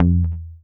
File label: GTR TRIK, Jazzistic; Univers Sons